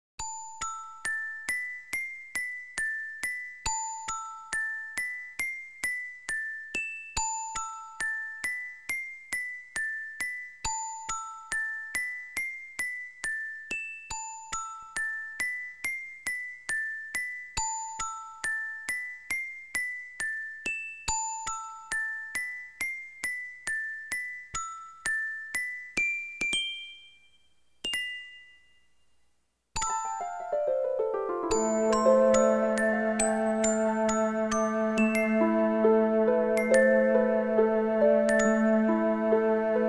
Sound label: Music Box